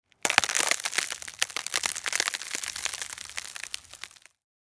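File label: Icing freezing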